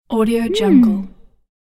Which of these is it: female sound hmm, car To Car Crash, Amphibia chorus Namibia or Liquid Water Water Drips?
female sound hmm